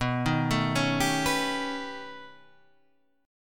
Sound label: B Augmented 9th B, chord